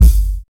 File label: bass drum one shot g key, Royality free kick drum sample tuned to the G note.